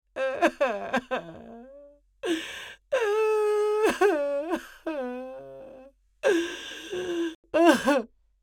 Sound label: Female mature woman crying and whimpering